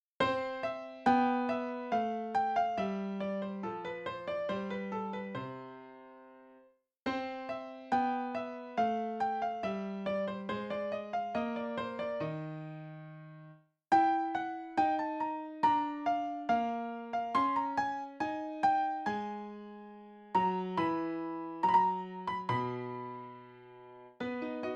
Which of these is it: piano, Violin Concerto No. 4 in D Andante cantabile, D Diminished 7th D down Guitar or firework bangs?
piano